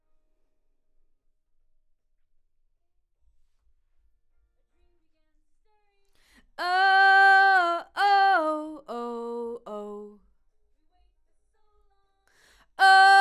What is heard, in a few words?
Vox start new